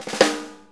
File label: 4-4 Snare Flam, Drum Loops; Drum Tools; Flams